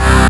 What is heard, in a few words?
Basses Root C